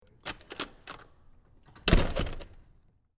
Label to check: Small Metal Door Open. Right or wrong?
Right